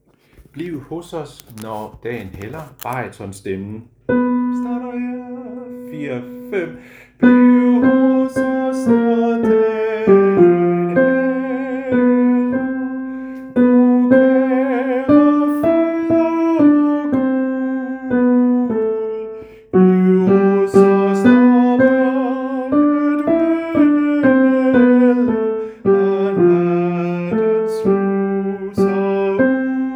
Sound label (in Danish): baryton; harlev koret; Bliv hos os